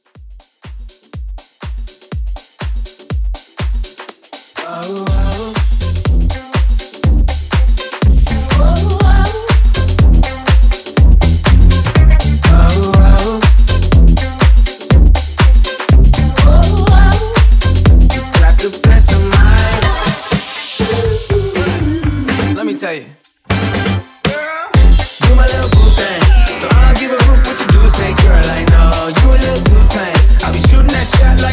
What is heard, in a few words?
House Re Drum